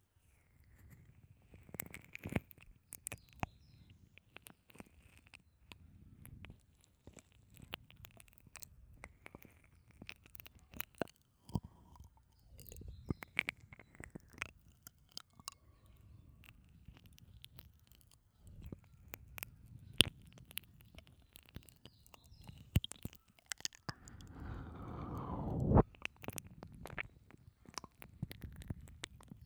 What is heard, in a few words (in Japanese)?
耳舐めオンリー; 添い寝フレンドと行く温泉旅行 -おもちゃえっちつき; フォーリー